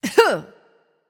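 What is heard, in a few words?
grunt attack, female; smite; voicepack; hera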